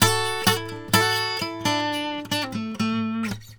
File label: Blues Guitar; ILIO; Fretworks; LICK